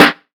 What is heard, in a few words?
Rich Lows Snare Drum D Key; snare drum samples